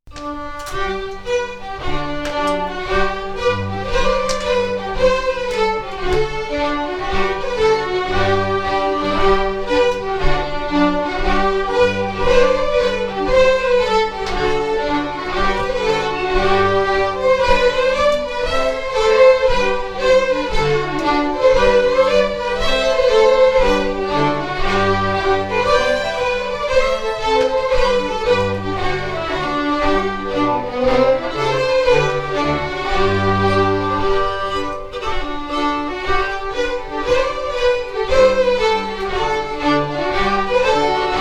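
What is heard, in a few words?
Reel, Scottish Fiddling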